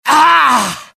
death, pain; mohaa; dialogue

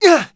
damage; mohaa; dialogue

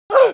hit, pain; mohaa

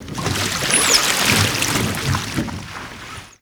row boat slow; mohaa; mechanics

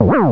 stun; superbroswar

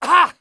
damage; mohaa; dialogue

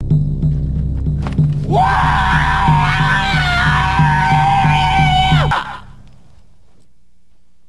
shootout; singe

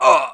hit, pain; mohaa

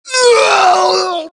death, Axis; mohaa; Mission 1

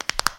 knuckles; cdogs

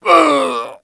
death; mohaa; dialogue

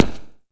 player tile; xash3d fwgs

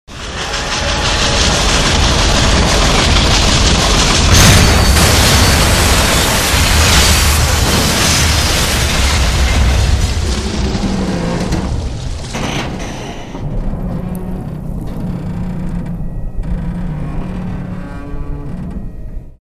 bridge sequence; amb; mohaa

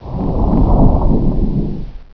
dive; hurrican

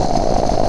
fire; scummvm; The Griffon Legend

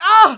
hit, pain; mohaa

